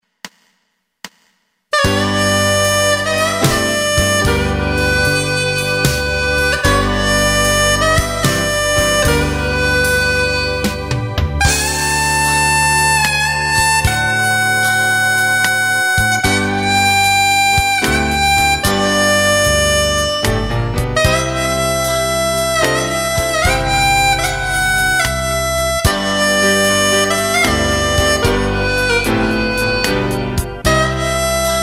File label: Harp; Silent Night